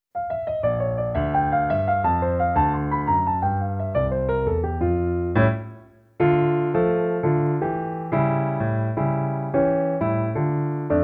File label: PLAY ALONG, latin